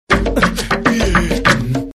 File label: Xperia Msg